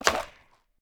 skateboard tailpop; smite; osiris

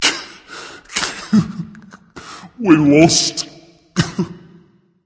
boohoo berserker; cuchulainn

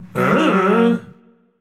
confused; smite; ra

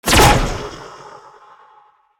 spear throw; smite; Anhur

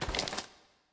phoenix step; smite; npc

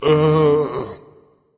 seriously berserker; cuchulainn

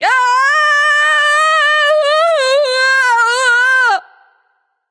fear; smite; voicepack; serqet

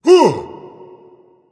surprised, voice; Smite; ymir skin6

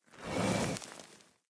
shield spin; Smite; Cabrakan

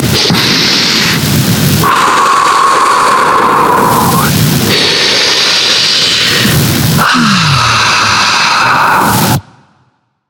breath; smite; morganlefay

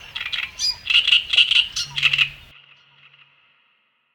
ambience bad birds; smite; conquest

